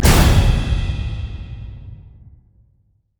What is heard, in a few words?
feint shield; riven skin05